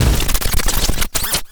Mechanical Malfunction Rumble

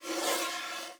Soft Slide Medium

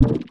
Sci-fi Pulse Gun Blast

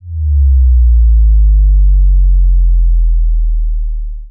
Clean Bass Drop

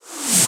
Synthetic Blow

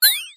Single UI Beep

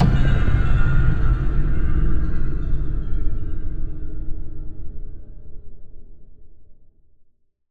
medium cinematic boom

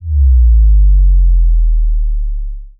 Clean Bass Drop

